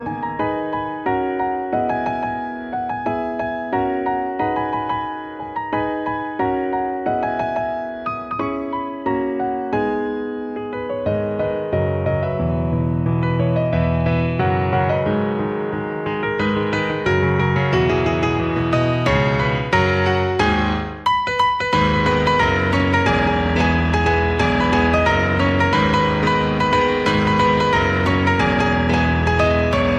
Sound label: ignite piano